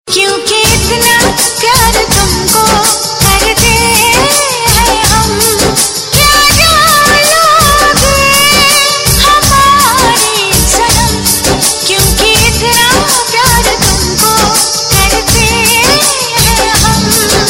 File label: Female